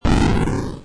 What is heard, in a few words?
bomb; doublespeakgames